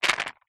household jewelry earings new on card several short movement shake